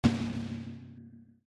Freezing Beam

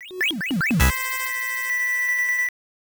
Round Start Sound

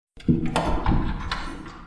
Closet Open Sound; don t be afraid the first toy

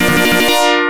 Victory; Super Smash T.V.; Genesis

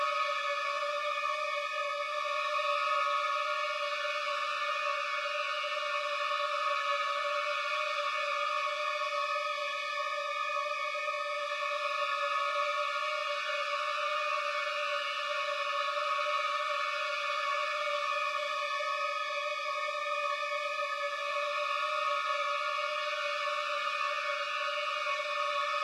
Dissonant; homefront gamerip 2011

